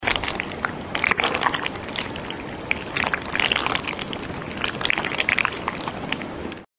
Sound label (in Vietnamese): Tieng thay ma nhai xac chet